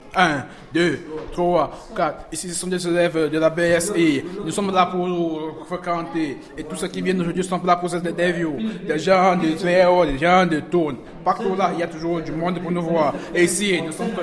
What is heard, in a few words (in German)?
Rap; BSI Konolfingen